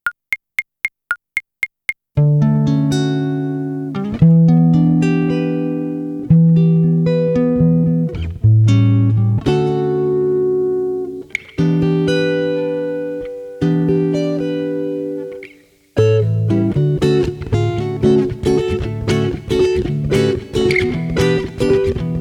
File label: Instrumental; Mini Musical Tales